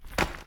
rocks explode

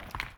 rock hit

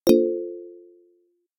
achievement

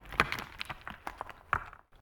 Rubble

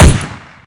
machine gun bullet spawn

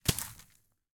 snowball hit

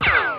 bullet whiz

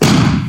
rocket blast

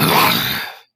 zombie moan

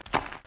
rock hit

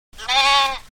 sheep

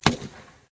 rocket fire

